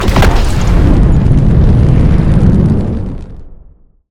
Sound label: core Launch; Mindustry